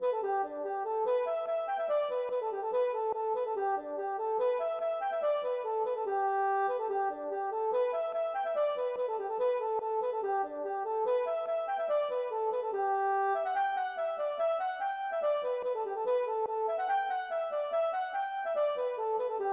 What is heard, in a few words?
polka